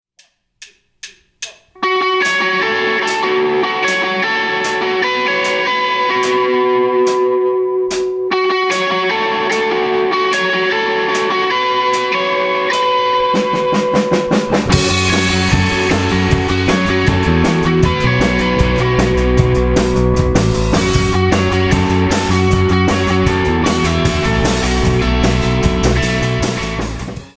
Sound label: strat axe ac30